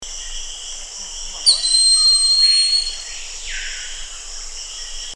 canto do gaviao de asa larga

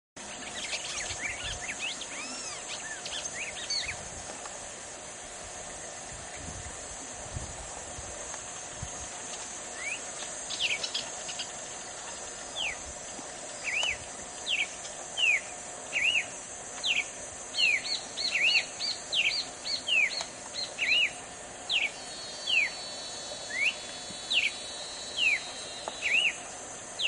canto do cardeal de topete vermelho

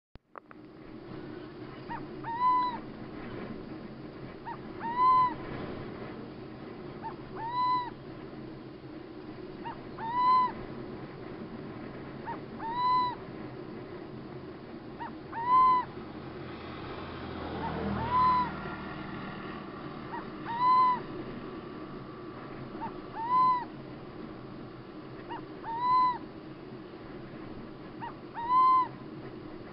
O Canto Da Coruja Boreal

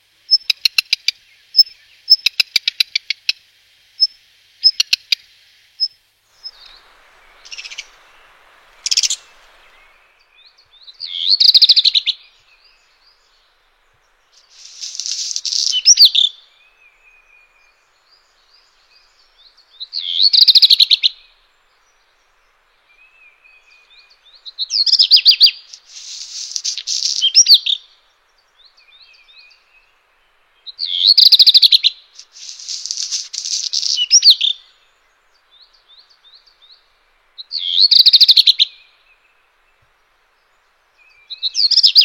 Canto do rabirruivo preto

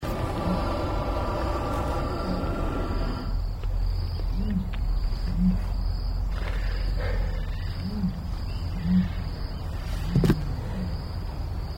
avestruz Struthio camelus

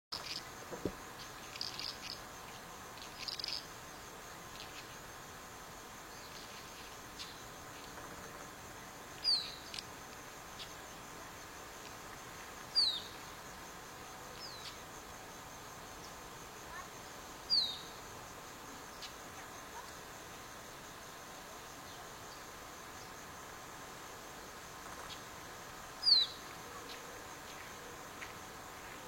canto do tuiuiu

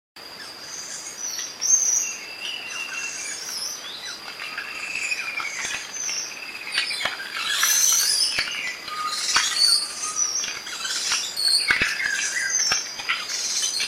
Canto do caneleirinho de chapeu preto

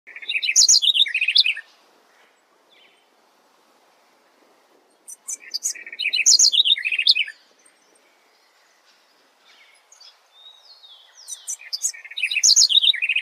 Canto do corruira do tepui

